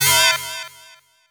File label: Transform